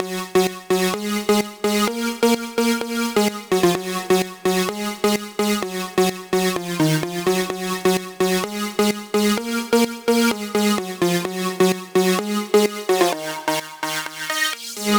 synth melody with filter end, Key D#, Bpm 128, Big Room House; Loop Nerds; Big Room House Allstarz